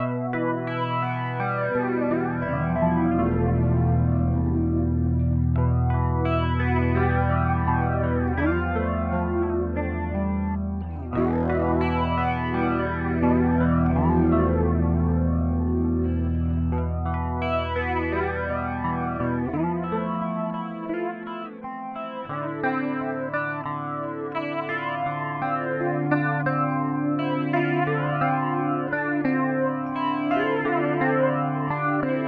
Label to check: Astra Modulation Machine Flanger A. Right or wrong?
Right